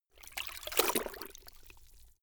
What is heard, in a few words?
cloth handwash; haus-garten; haushalt